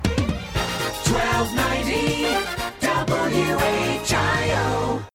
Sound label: jingle; whio